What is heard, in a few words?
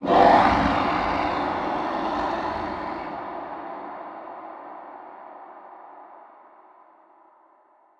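ambience unknown creatures big snake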